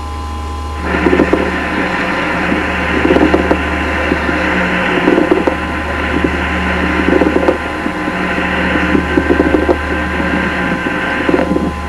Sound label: MEDIUM RALES, airway; emt